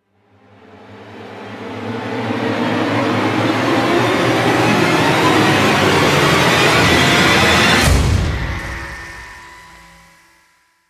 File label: Rise; Ninja Tracks